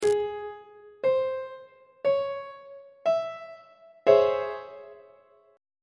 c sharp minor major 7th 2nd inversion on treble clef